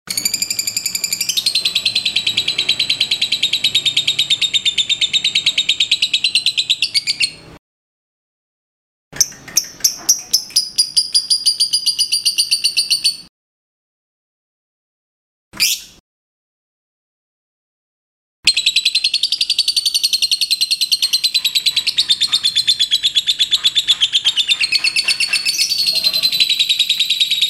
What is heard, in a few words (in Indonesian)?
audio lovebird liontin